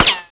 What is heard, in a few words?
metal, srifle; SINDEMO; weapons